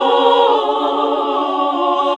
HAL CL. SIN, CL. SINGIN`; Sound & Vision - Gigapack II CD 1

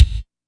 KORG 01W KIT; Rubber Chicken Software Sample Bank

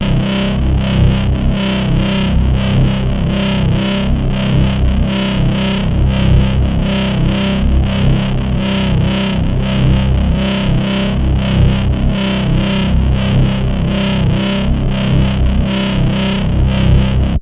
Modular Revenge, Basses; Drum & Bass; AMG - Prototype Drum & Bass

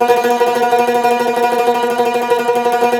CUMBUS TR, Cumbus Tremolo

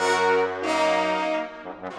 Brass

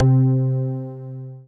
Dance Floor Bass, Multisample Basses; Best Service

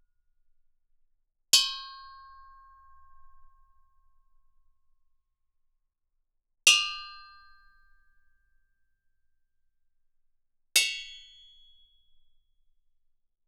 Gamelan; Propeller Island - Complete Gamelan

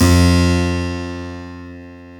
KEY HRPCR, Roland; Roland - LA Composer vol. 1

